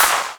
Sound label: ms20 clap snare; MS-20 drum pack